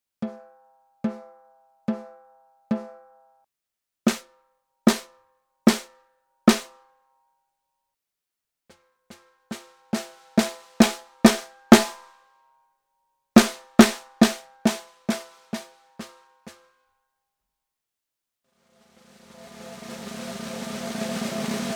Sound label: vintage mahogany koa med, drum